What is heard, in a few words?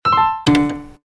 Toner; Qua tab PZ LGT32